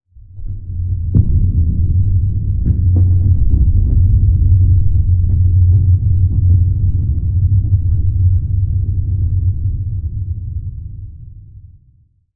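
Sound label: asteroids rumble; Earth and Beyond; EA Games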